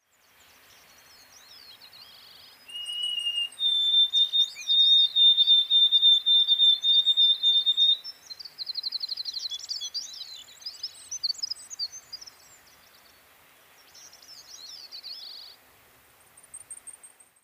bruant a gorge blanche

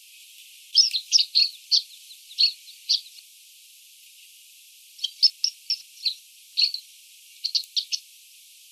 chant niverolle alpine

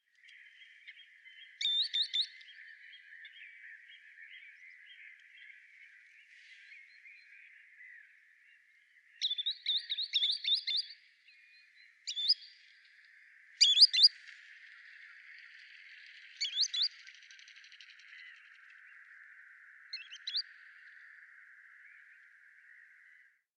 chevalier cul blanc tringa ochropus

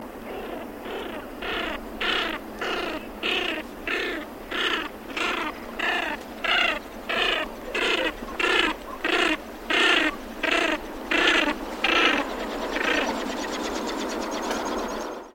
fuligule milouin

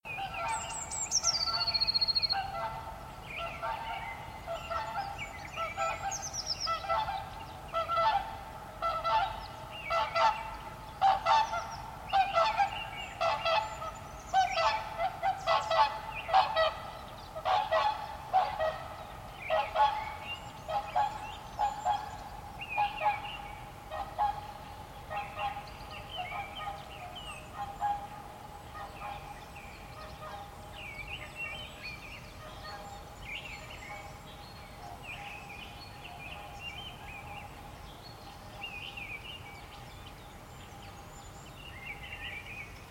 cygne chanteur, cygnus cygnus

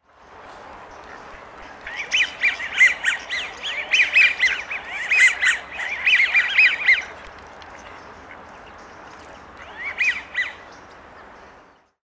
canard d'amerique

